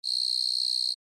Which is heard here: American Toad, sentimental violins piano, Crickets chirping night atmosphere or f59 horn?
Crickets chirping night atmosphere